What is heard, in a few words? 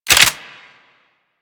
swarm rifle reload; Warframe; Infested; Shock Swarm Rifle